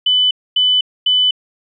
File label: DP Three Chime